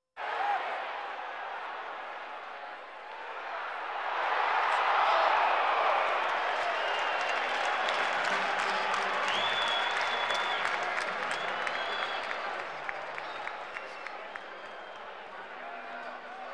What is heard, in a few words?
Sports crowds